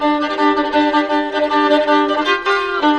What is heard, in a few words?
violin; fiddle